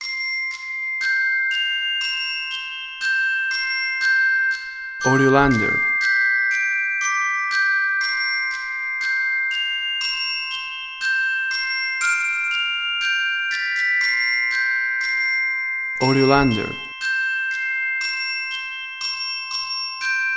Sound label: Glockenspiel; Bobbie Shafto Went To Sea